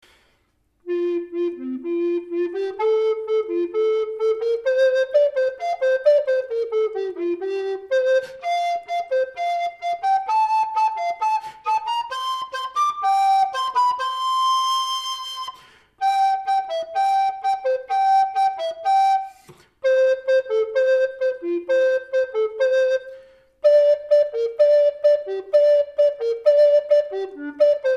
tenor sound sample

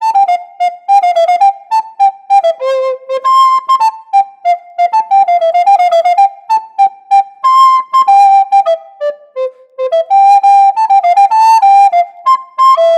Yamaha YRA302 BIII Alto Recorder; FluteShop

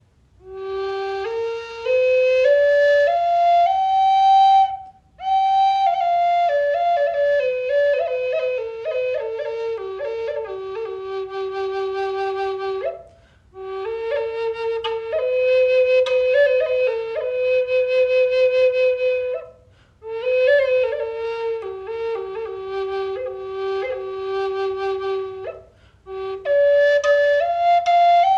lakota flute sound; Indaki

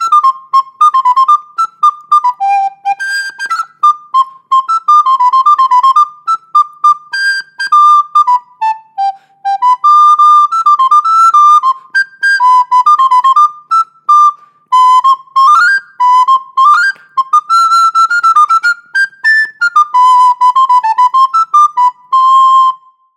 Yamaha YRS24 B Soprano Recorder; FluteShop

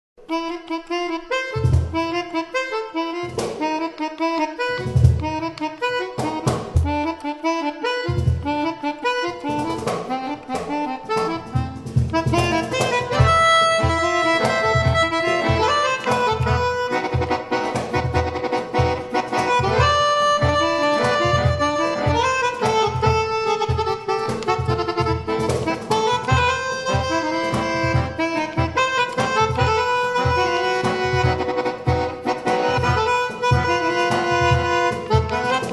Melodica sound

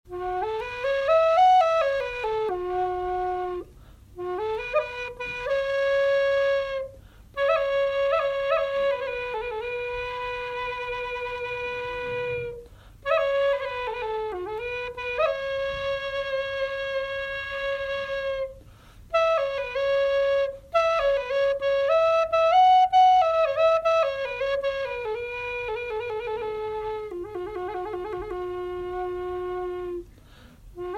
lakota sound

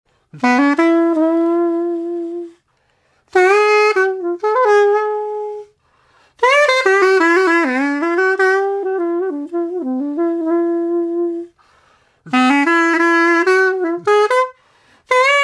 Pocket Sax sound

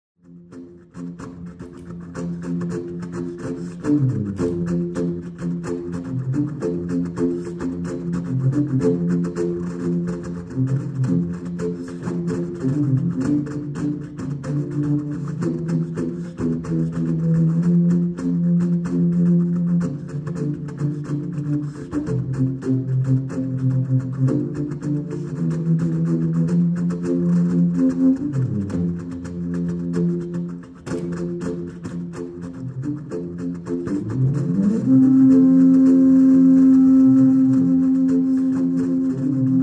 contrabass flute sound sample

